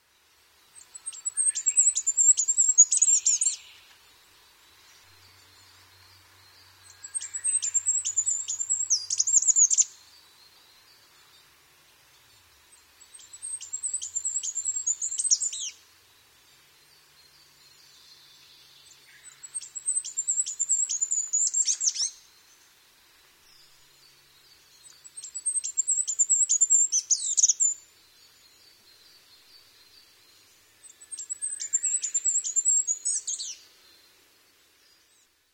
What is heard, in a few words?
kikuitadaki; キクイタダキ; さえずり; 2018年5月12日 静岡県富士宮市